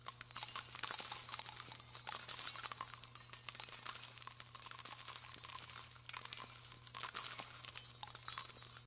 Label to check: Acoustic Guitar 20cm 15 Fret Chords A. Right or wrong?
Wrong